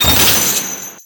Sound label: Enemy Pirate Anchor Hangdown